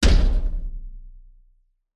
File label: impact metal large thump thud rattle reverb